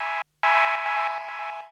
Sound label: KEY SHOT TEKNO 140 A; Techno2; Singleshots